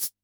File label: shake